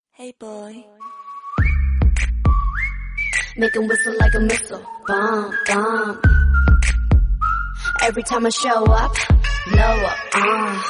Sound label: iphone whistle remix hip hop